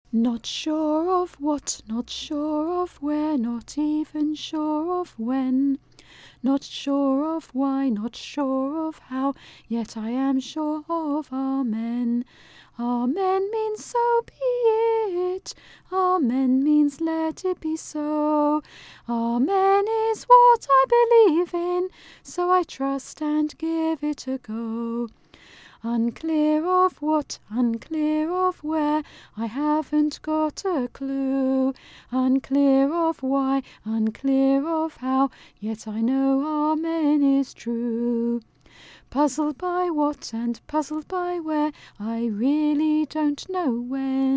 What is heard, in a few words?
Amen